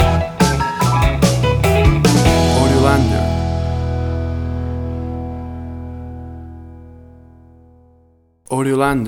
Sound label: 50s Pinup Stinger